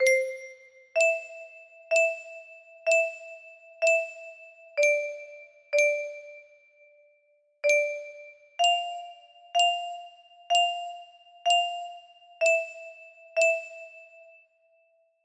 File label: music box, music box melody